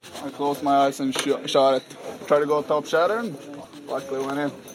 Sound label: Goal